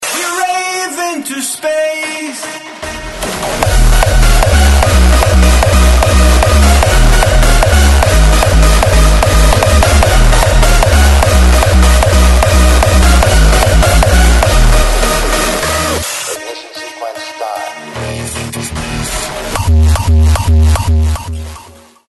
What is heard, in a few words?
rawstyle rave into space